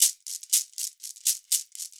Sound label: drm, 120, MIXED DRUM LOOPS, WORLD DRUMS